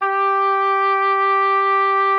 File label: Oboe, G4; Xsample VOL-6